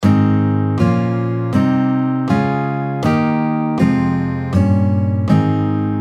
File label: G Major Chord Scale, guitar